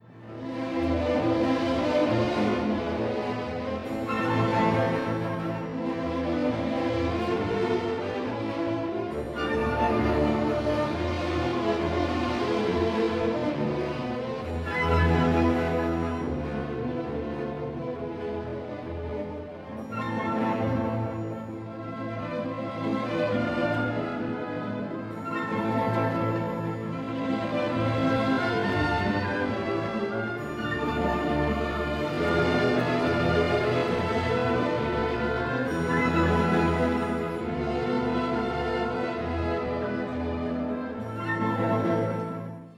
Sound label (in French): accompagnement en la mineur; Brahms